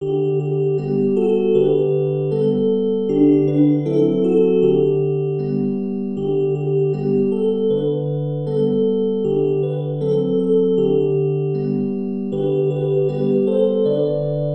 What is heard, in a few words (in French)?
Au Clair de la Lune - Karaoke